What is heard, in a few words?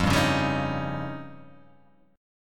F 7th Suspended 2nd Sharp 5th